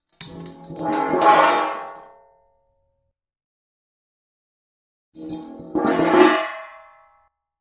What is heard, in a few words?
Pot, Saucepan, Roll x2